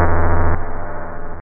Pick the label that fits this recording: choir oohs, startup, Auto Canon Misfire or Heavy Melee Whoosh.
Auto Canon Misfire